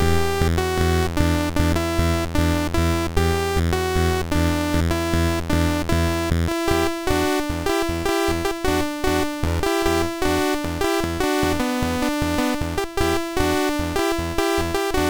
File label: sid MOS6581R3, GAMES; Buck Rogers Countdown To Doomsday